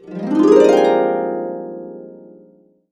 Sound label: Harps; Complete Harps; USB Soundscan vol.62; AKAI